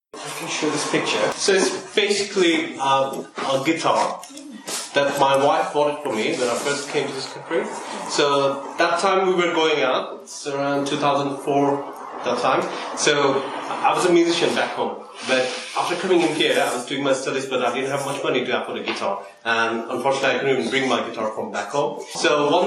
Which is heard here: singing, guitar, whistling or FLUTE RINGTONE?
guitar